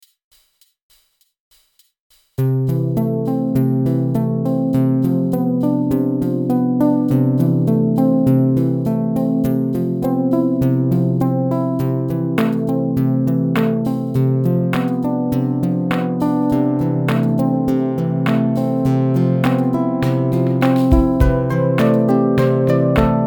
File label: harp